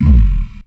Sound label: h perc; smash